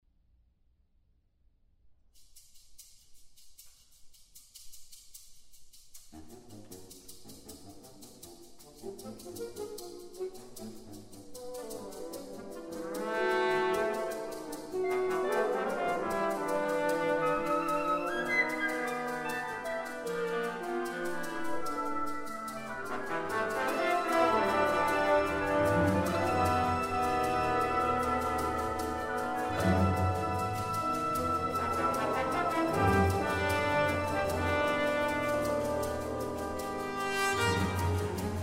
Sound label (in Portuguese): caracaxa